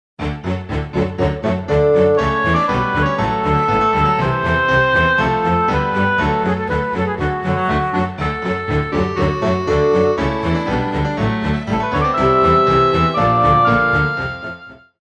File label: classical